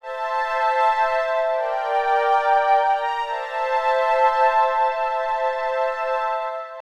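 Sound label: synth electro 140 A; Techno; Keys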